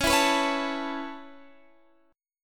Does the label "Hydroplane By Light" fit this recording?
No